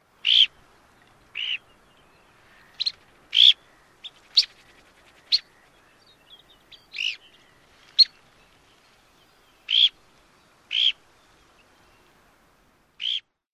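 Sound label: red phalarope piik & drreet calls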